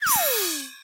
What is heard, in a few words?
cash stack sym fall; vegas night life